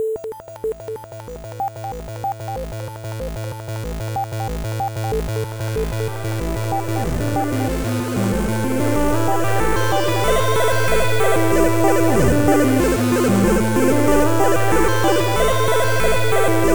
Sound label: 8bit finger skillz; Chiptune